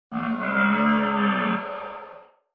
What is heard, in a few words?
Deer